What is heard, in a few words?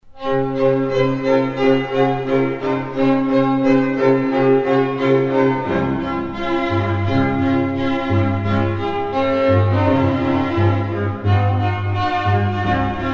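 Jingle Bells, String Quartet; Classical; Celestial Strings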